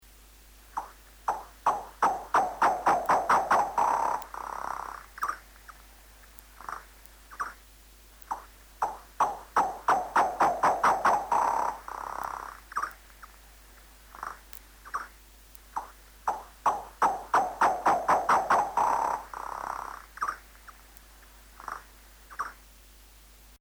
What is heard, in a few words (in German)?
Abendsegler Balztrill verlangsamt